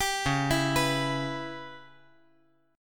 Db Minor 7th Flat 5th Db; arpeggiated; JGuitar

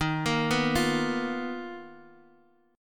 B-Major Sharp 11th Eb

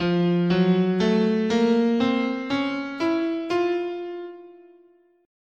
F Double Harmonic Major, scale

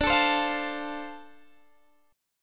D-Minor 7th D Guitar DADGAD, chord

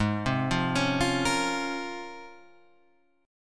C sharp Major 13th, chord

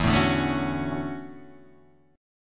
E 13th Suspended 4th; 0 0 0 2 2 2; strummed

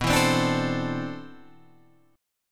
C Major 13th B

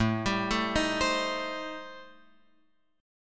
A-Minor 7th Flat 5th, Guitar Open F, chord; Am7b5; arpeggiated; x,4,7,4,6,7

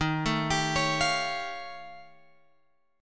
D sharp 9th Flat 5th D sharp, guitar; D#9b5 Chord {11 12 X 12 14 13} arpeggiated

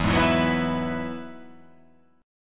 E Major Add 9th

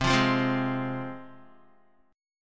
C Suspended 4th Sharp 5th, C, down, Guitar; Csus4#5 strummed

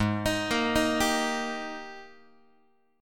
G sharp Major 7th Suspended 2nd, Guitar; x,4,6,8,7,6; JGuitar

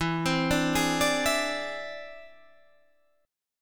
E Augmented 7th E down Guitar Half Step Down

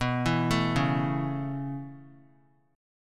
B 7th Suspended 2nd Suspended 4th down, chord; B7sus2sus4; arpeggiated; JGuitar

